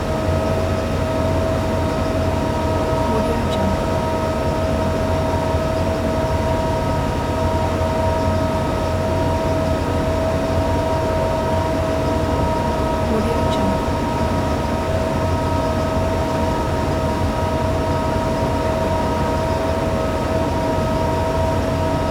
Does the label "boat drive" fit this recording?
Yes